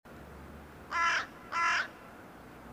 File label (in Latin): Corvus corone; SETE